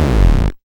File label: squelchdown; Sonic Foundry (Sony Creative Software); Ugly Remnants VOL-2; Beatstuff